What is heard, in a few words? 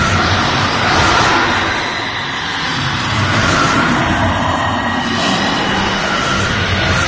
charge, lostsoul; doom3